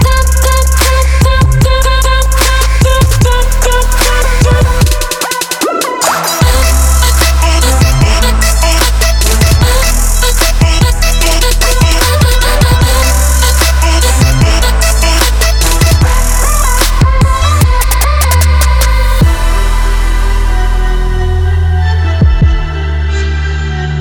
trap

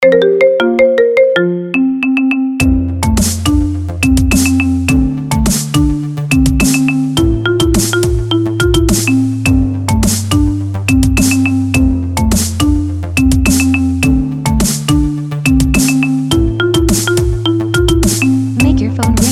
marimba mix

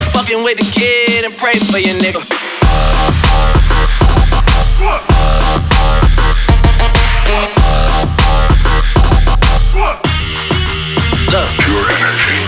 energy trap

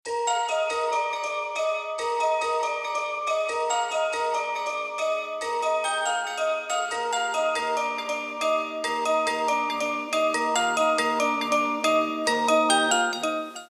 chime remix; undertale

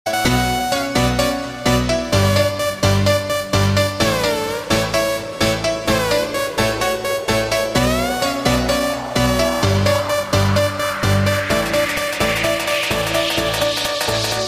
electro remix

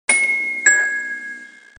sms ringtone

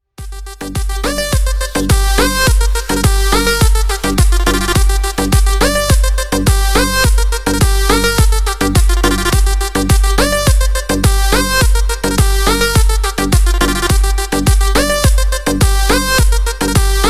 arabic mix, ringtone

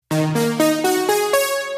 sms

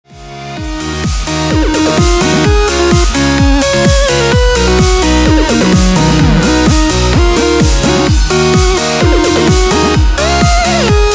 melodic electro; DubStep

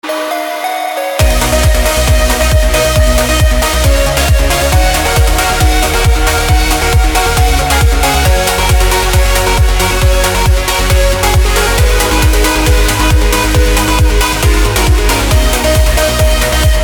trance of space

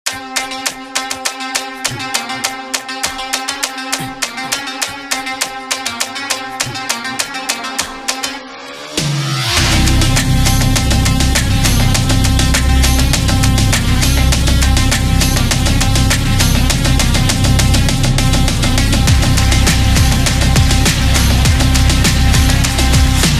drive car music mix

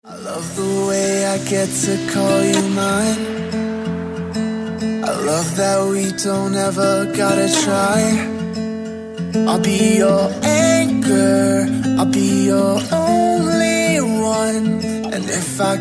rock n roll

